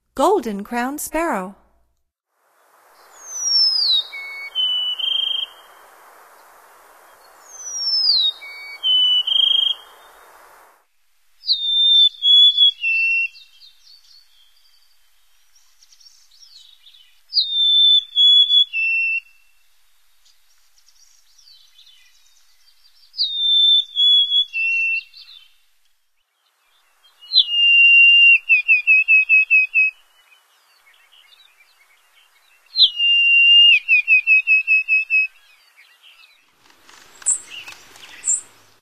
Golden-crowned Sparrow, Songs of Birds of North America; Stokes